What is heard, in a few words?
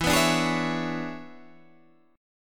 E 13th, Guitar, Half Step Down